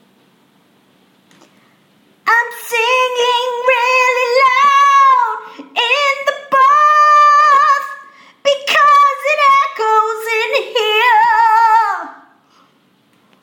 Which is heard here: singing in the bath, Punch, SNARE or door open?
singing in the bath